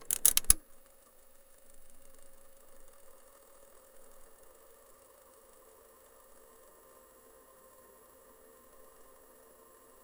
a reel to reel movie

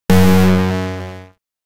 game show wrong answer

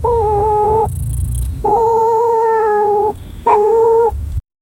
humming cat sound

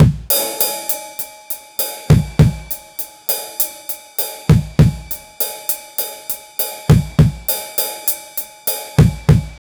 circus drum roll

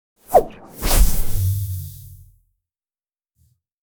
lazy brain pillow attack, pow

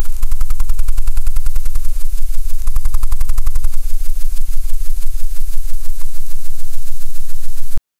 ball flying through the a, objects

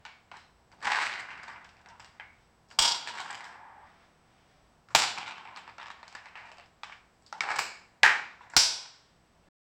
grunt of exertion slight, dumbbell

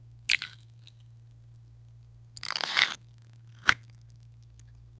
start with long silence, biscuit

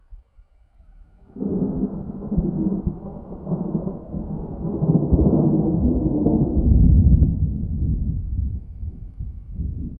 storm, sound-effects

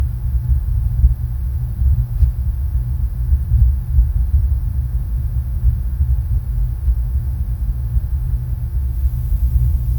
noise

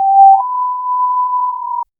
clean crisp, call end

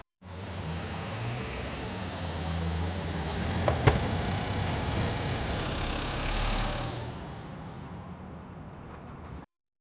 pushing bike in garage

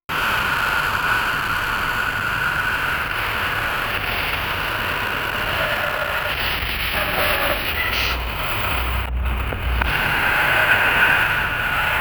ambient noise distorted voices

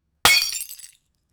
the sound of breaking bot

